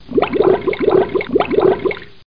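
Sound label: bubbles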